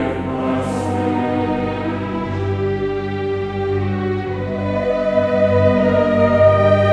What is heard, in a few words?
PRELUDIUM - General Ensemble